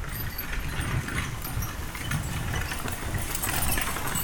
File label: Horse Drawn Wagon By; Electa Quinney